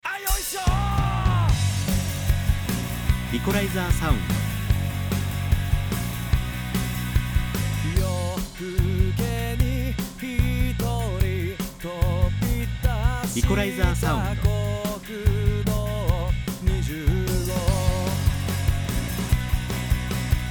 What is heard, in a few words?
band eq